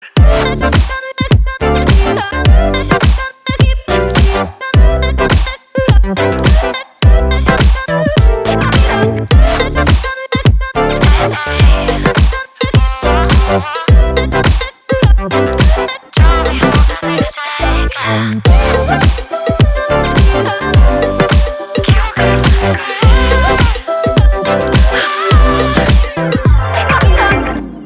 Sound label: instrumental bgm